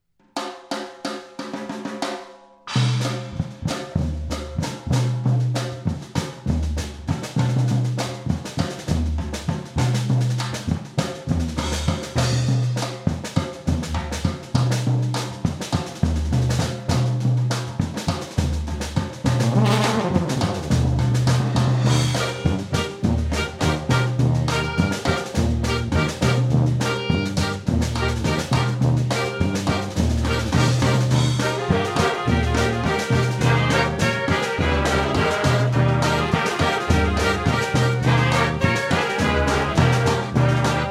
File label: Fanfare